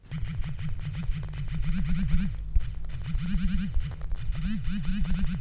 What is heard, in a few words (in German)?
vibration tippen, Vibrationsmotoren im Smartphone; samsung galaxy a51; So groß ist der Unterschied zwischen Vibrationsmotoren im Smartphone